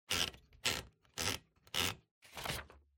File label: Typewriter Paper Eject